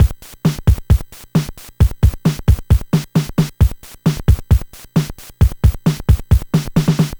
NES Drum Loop; Sonic Foundry (Sony Creative Software)

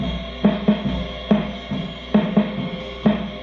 Chamberlin Rhythmate Loop, Drum Machines

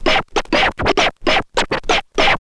scratch short, Vinyl scratches

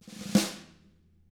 roll, snare; ILIO; Double Platinum Drums 1

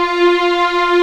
F4 LEG MFI R, String; East West; The Ultimate String Collection

